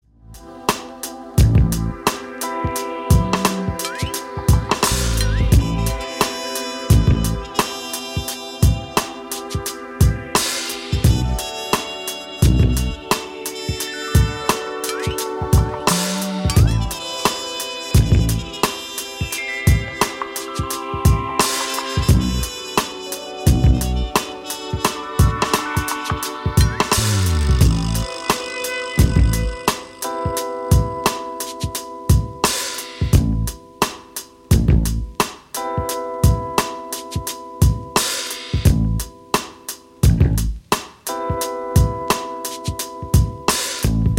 Instrumental

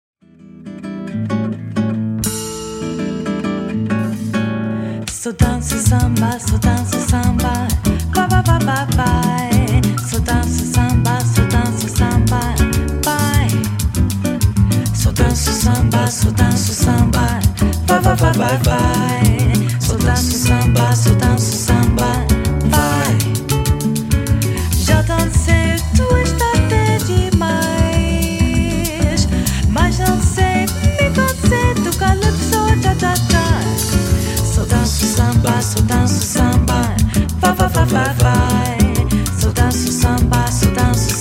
Samba

